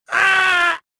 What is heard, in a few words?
pain; jedi